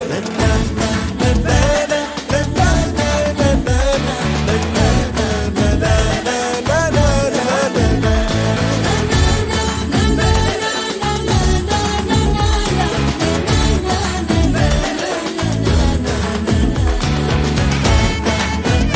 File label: BGM Humming Ringtone; Ayalaan